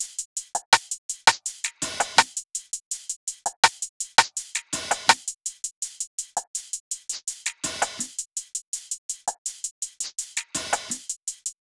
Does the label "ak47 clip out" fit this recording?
No